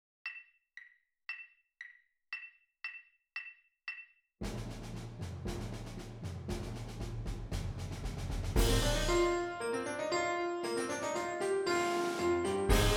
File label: Fanfare for the 16th Percussion and Piano no metronome Slow q116